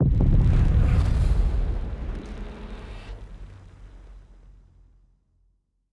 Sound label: ship terralize; ships; azaryn; Stellaris; paragon